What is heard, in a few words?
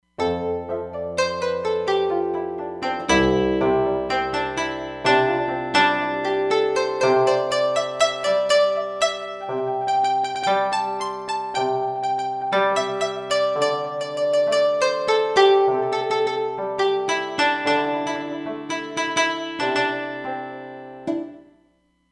Koto